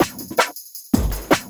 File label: Loop, Drum & Bass, 160 BPM